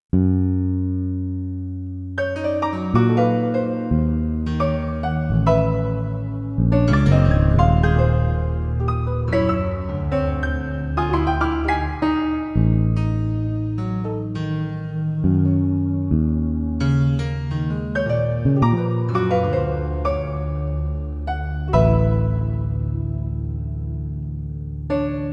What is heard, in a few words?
unprepared piano